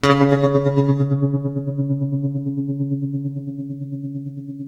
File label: TREM C#2, Guitar, TREMELO; East West; Steve Stevens Guitar Samples Collection